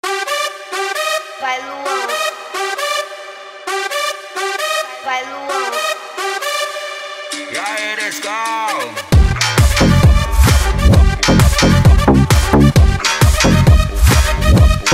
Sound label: bass; pack bases mega funk 2020